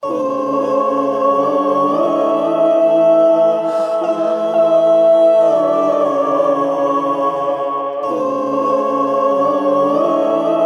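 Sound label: Monastery Phrase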